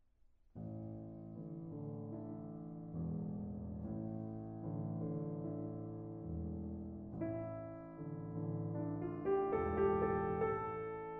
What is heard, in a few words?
Piano